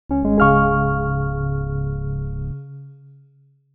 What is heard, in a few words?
Satisfying Ending modern 111bpm, Stingers; Ultimate Everything Music Bundle; Stingers, Blips and Dings